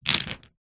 sport snooker pool junior balls gather together scoop on table